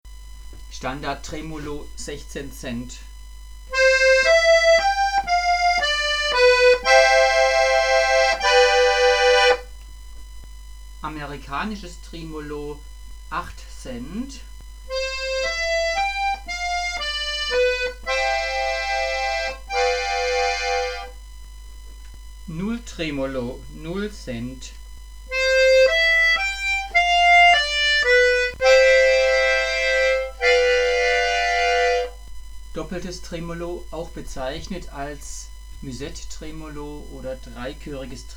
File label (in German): tremolo, akkordeon; deutsch